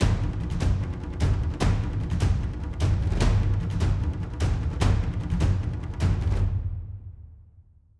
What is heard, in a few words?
150 Cinematic perc